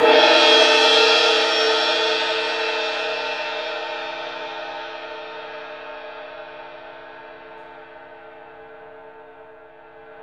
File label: CYM CYMB, Orch Crash; Roland LCDP03 Orchestral Perc; Orch Cymbals